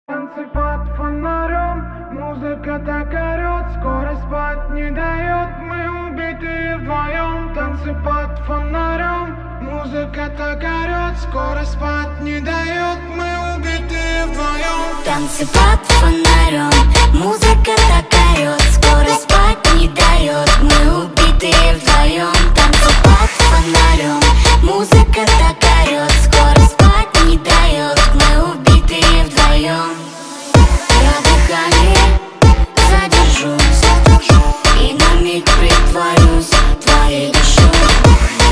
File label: brazilian funk remix